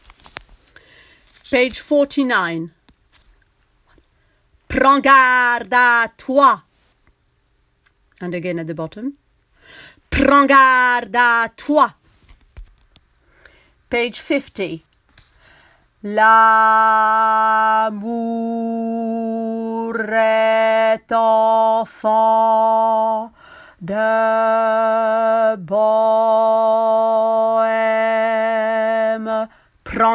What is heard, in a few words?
bas; Carmen